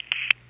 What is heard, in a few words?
start; pulsar; panel